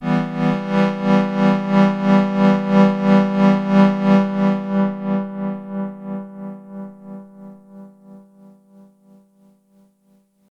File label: Synth Tremolo Pan; TC Electronic 2290 P